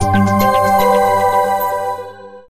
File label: Start Game Jingle; Namco Museum Essentials; PS3